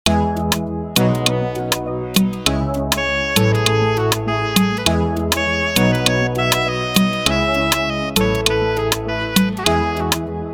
Freebeat

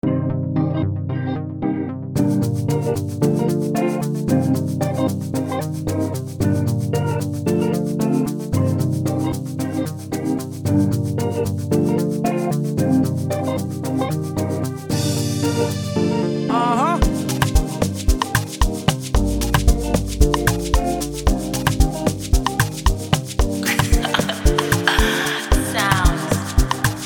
amapiano

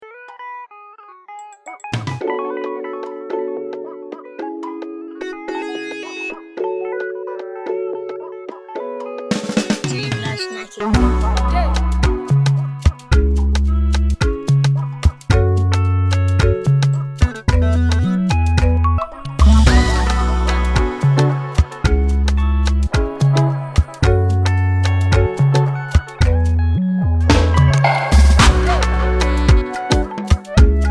Freebeat; End SARS; Prod By Jayblashnakit

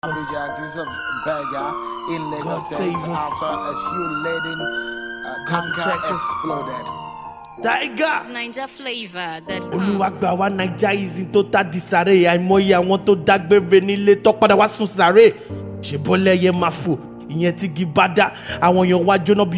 Freestyle

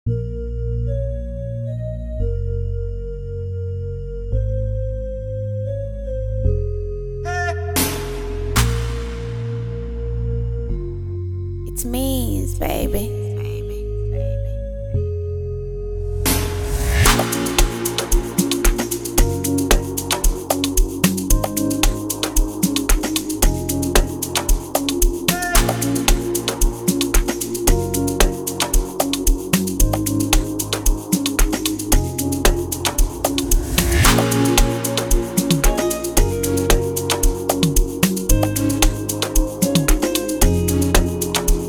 Amapiano type Beat

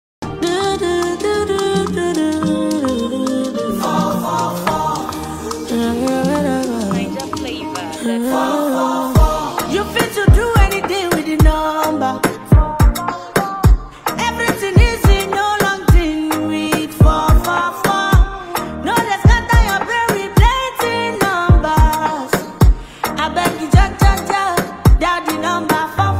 Advert Song; Airtel

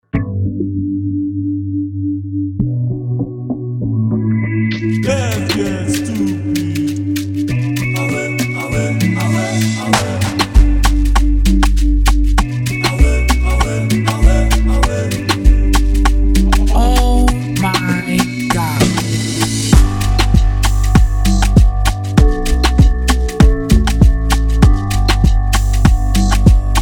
Freebeat Afrobeat; Prod By Kelvin Drayz

